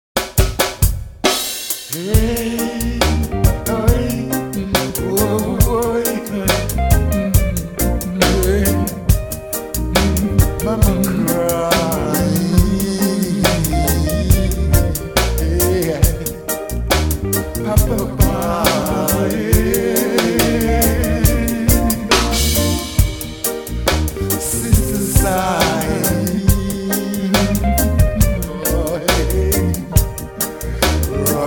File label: Mama Crying